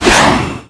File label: quickstep; sonic